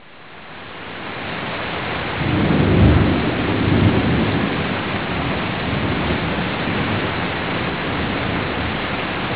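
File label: rainstorm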